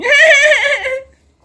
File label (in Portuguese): Risada de ladrao